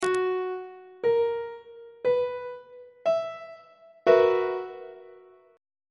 c flat major 7th suspended 4th 2nd inversion on treble clef